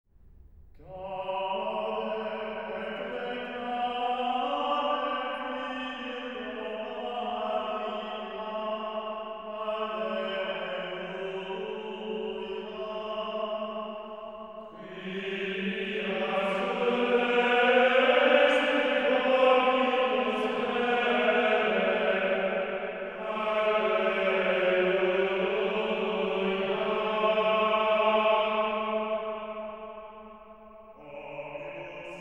Oratio chant, Gaude et laetare Virgo Maria, alleluia; Oremus, Deus, qui per resurrectionem